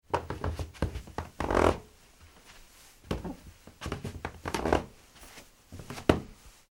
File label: human footsteps shuffling carpet creak; Footsteps Shuffling On Carpet / Creaky Wooden Floor; Footsteps (Sound Effects:Human); McKinney Sound